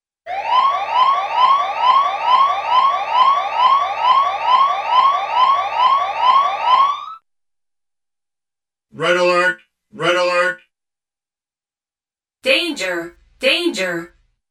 Siren Blasts - Computerized Voice 'red Alert' & Danger; Cartoon; Hollywood Edge; Cartoon Trax